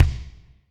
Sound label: Kik Lo Wood Hall, Drum Machines Alesis D4